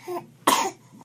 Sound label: cough, Baby; CosmicRage